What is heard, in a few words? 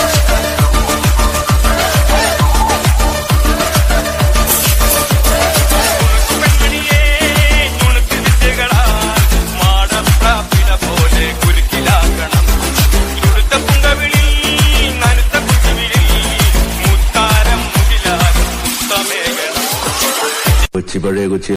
malayalam ringtone